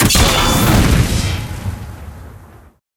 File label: 3 spins Slash; netent